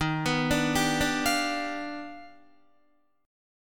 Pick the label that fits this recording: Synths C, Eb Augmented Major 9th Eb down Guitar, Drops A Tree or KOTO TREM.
Eb Augmented Major 9th Eb down Guitar